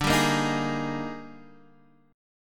D sharp Major 11th, D, guitar chord

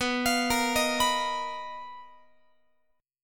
B Major 7th Flat 5th B down Guitar; arpeggiated

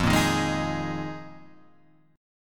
Eb Suspended 2nd Suspended 4th

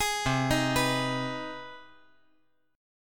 C Minor Major 7th Sharp 5th, C

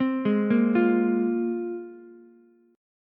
A sharp 7th Suspended 2nd, Ukulele chord; arpeggiated; JGuitar

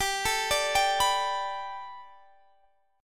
B Minor 7th Sharp 5th G, chord

